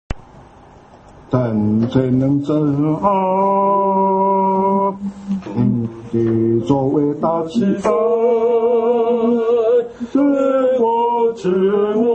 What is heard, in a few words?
hymn; worship